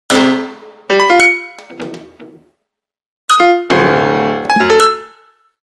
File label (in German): Klavier